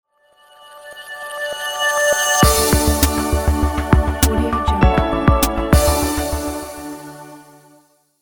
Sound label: bright logo reveal; tatreal